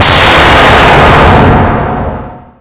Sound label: boss die; ScarletBlackMarket; 3drender